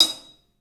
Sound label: HAT, CLUBHAT, 3D Drums; 3D Sound